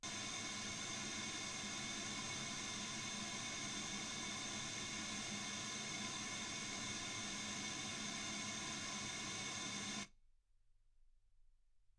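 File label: white gaussian noise 48kHz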